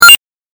8bit キャンセル

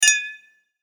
グラス乾杯

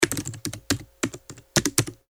タイピング パンタグラフ短

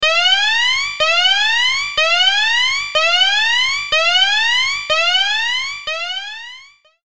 警報

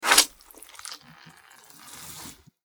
にぎりしめ 単発

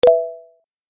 正解

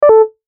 決定

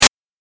8bit ダメージ

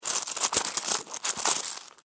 お金 ジャラジャラ

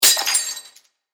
お皿が割れる音 響きあり

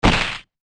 打撃

